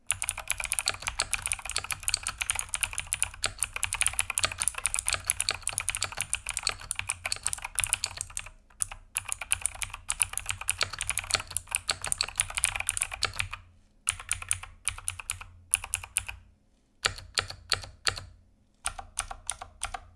razer blackwidow v4 pro soundtest

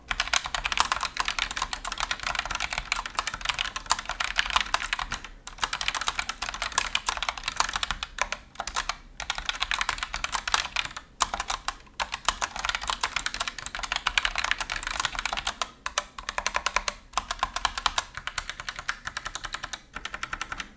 soundtest roccat vulcan II max

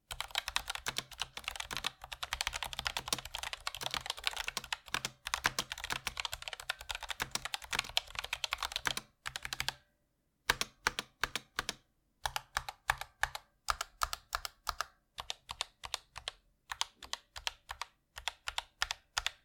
soundtest claymore II

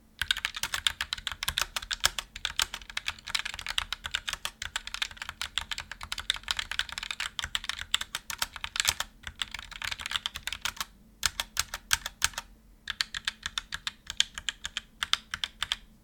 soundtest roccat vulcan II mini

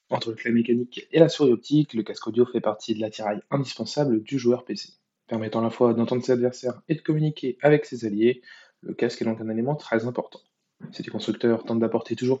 soundtest razer hammerhead pro hyperspeed

